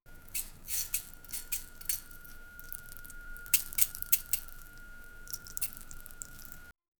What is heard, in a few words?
sizzling sound of hot che; appetizing